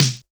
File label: Snare, Sony DRP-2